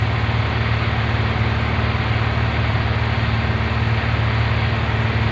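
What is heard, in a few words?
plane interior, ac130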